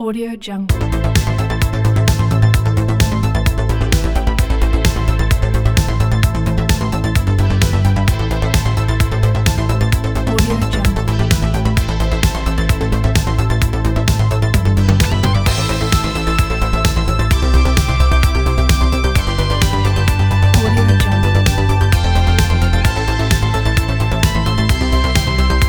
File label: synthwave; tuneboy